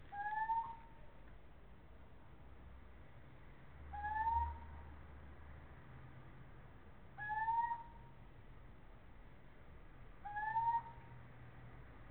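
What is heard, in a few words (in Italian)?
canto verso maschio civetta comune athene noctua little owl steinkauz mochuelo europeo cheveche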